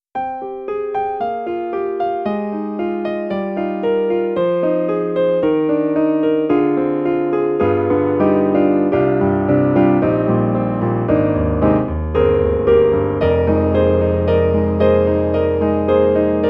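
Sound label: Kawai Retuned, piano; SoundFont2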